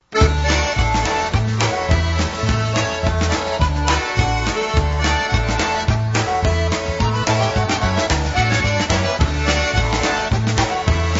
WALKING WITH MARY POLKA

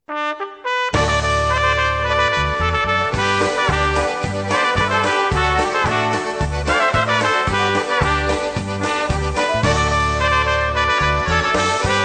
instrumental

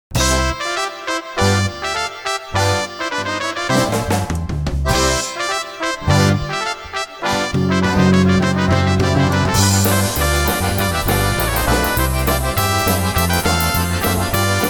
Squeezebox Polka

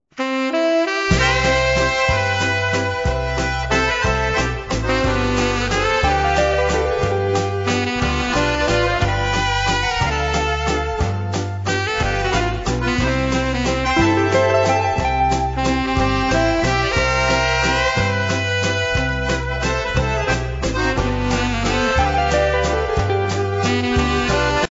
harmonika waltz

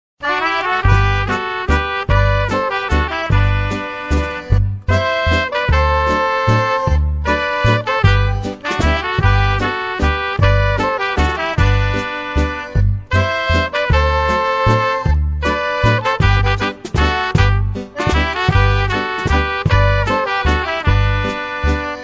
waltz

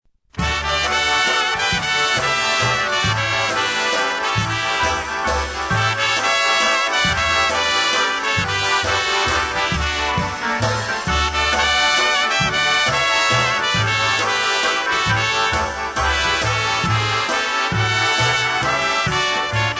waltz

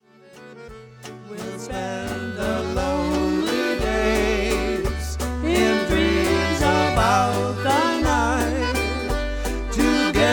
Waltz

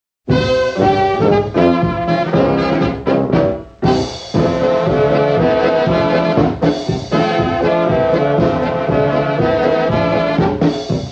SCHOTTISCHE

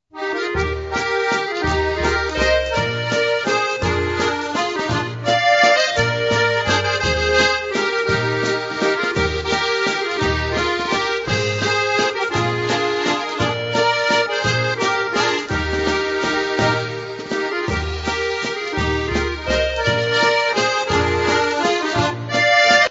waltz

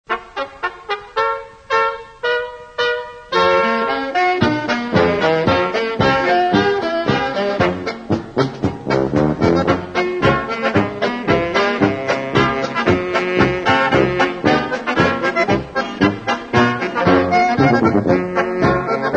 POLKA

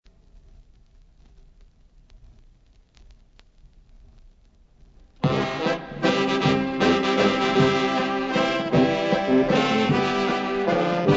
waltz

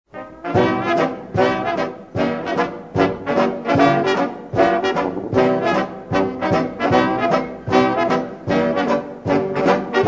schottische

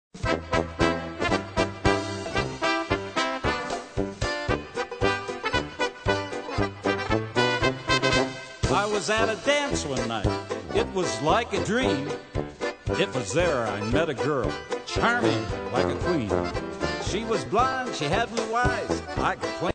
polka

